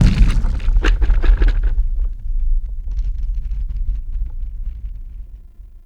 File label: Large Cave Quake; Ambience; Warframe; Duviri; Murmur